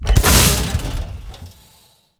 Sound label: fatman fire; weapons